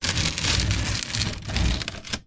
household plastic esky slide slow short on slightly gritty concrete patio; zapsplat